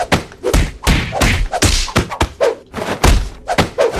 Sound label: fighting sound effect